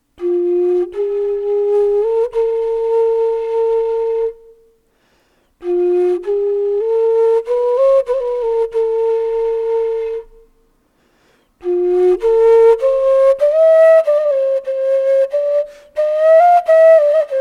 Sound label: Quena